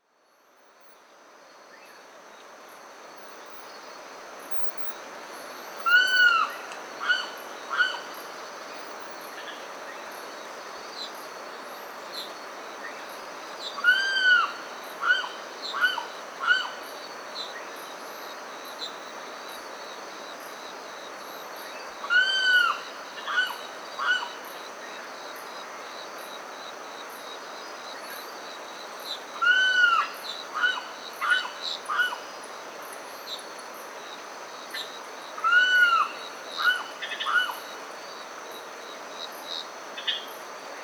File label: Aramus guarauna guarauna